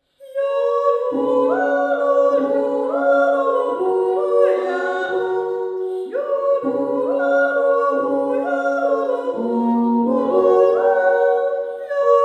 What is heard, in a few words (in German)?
Alphorn mit Jodel